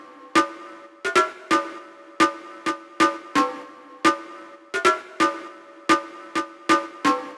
Drum Percussion Loop Dry 130

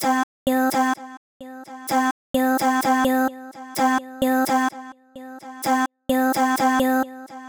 Vocal Chops Bpm 128 Key C

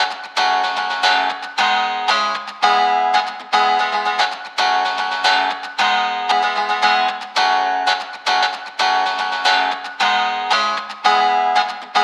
Guitar 114 Bpm; Glory

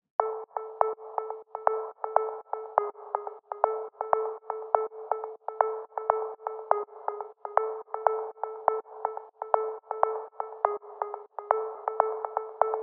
122bpm Pluck Tail; Melodeep Kit 5

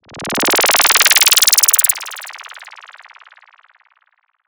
Laze Raise Fx

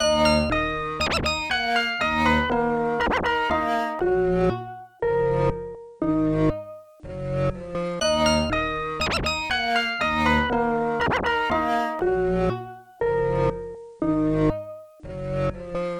Chopped Melody Loop D min 120bpm

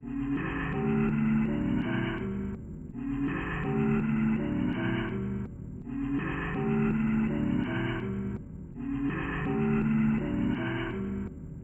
Melodic Pads

